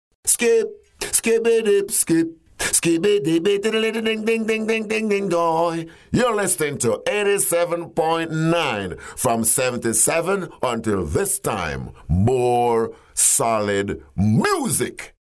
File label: jingle